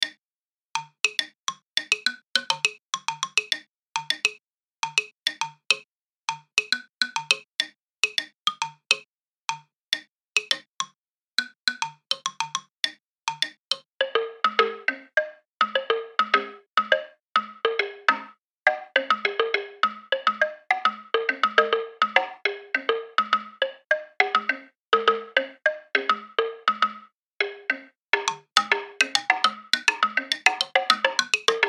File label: STIRRING XYLOPHONE